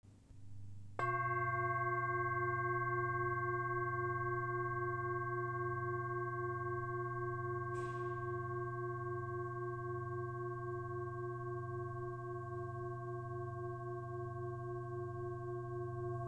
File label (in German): Dharma Chakra Buddha